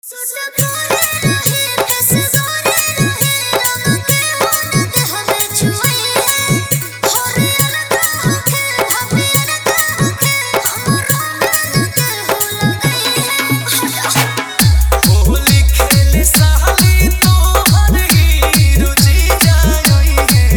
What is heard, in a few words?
Holi Hard Bass Mix